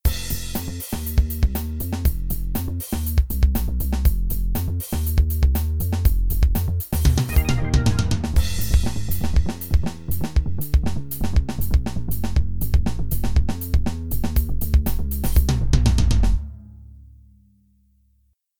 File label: Jingle